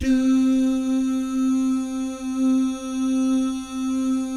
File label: "DOO" GUYS, Vocal Jazz Fx